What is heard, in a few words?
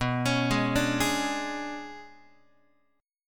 B Minor 6th Add 9th B, Guitar; Bm6add9 arpeggiated; JGuitar